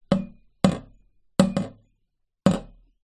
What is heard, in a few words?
impacts metal pole hit single pane glass window gentle x 1